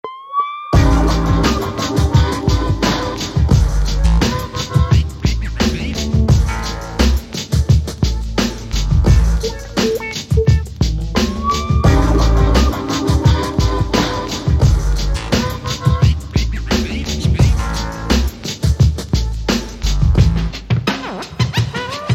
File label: techno